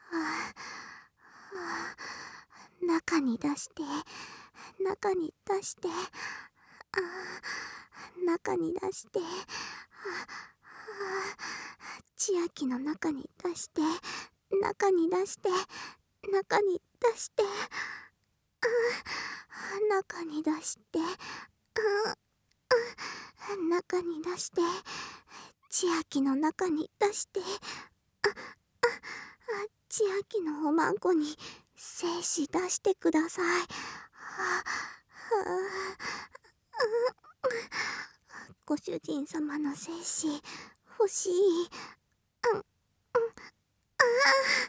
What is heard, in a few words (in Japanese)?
喘ぎ, ASMR